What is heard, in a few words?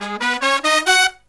Killer Brass Riffs, HI; USB Soundscan vol.29 - Killer Brass Riffs; AKAI